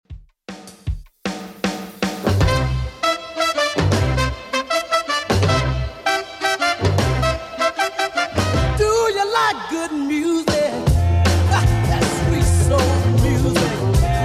60s Redrum 156bpm

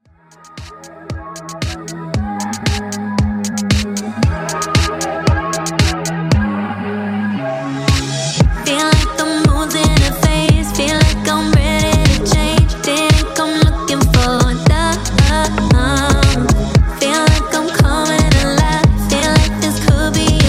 Club Redrum 115Bpm

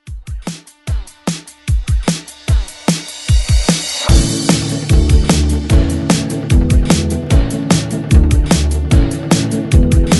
50s ReDrum 149 Bpm

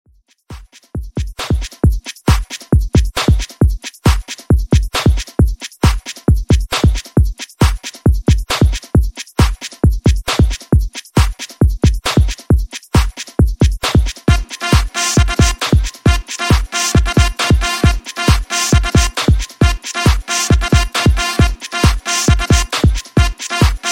Top40 Redrum 135 Bpm Clean

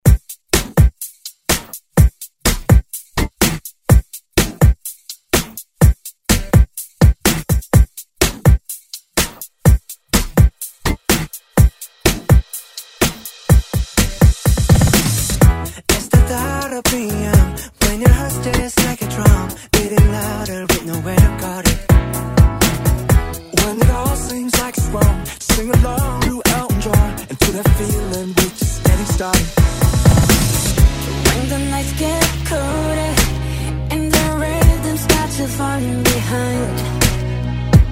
Pop Top40 Redrum

